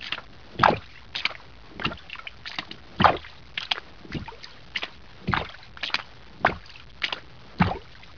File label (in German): schlamm gehen; geraeusche menschl